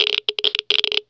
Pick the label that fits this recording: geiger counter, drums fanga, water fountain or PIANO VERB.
geiger counter